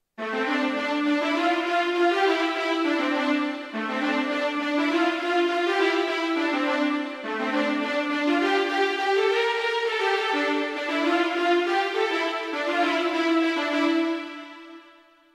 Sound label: theme bgm piano; pirates of the caribbean; jack sparrow